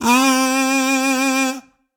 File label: Kazoo, Playful Whistles; Instruments; Ableton; Factory Packs; Lost and Found